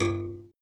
grow; shweets sim

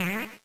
bounce; shweets sim; snivy

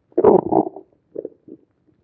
gurgle; shweets sim; snivy

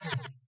miss; shweets sim

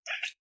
kiss; shweets sim; snivy

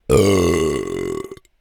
burp; shweets sim; braixen

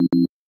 upgrade Fail; shweets sim

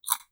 eat; shweets sim

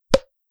collide; shweets sim; braixen

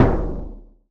boom; shweets sim; braixen

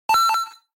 nickel pickup; shweets sprites; cutscenes; womb ending

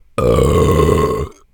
burp; shweets sim; braixen

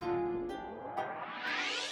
wave Begin; calamity-cobra-2

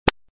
warning; shweets sim

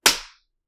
smack; shweets sim

